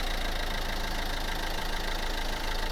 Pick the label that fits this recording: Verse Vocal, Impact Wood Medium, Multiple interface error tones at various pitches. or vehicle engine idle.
vehicle engine idle